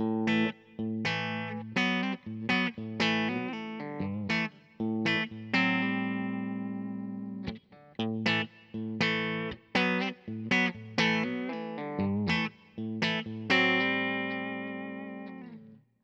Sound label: Roswell Splitted Bridge Humbucker Clean; Tesla Pickups vs. Roswell